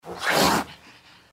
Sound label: gecko claw atk vox